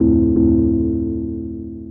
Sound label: house synth 125 A, keys